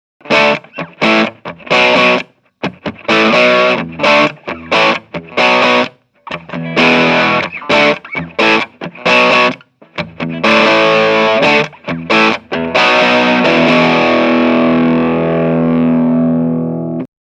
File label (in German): strat steg crunch